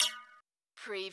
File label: metallic